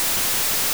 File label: Waterfall; Zelda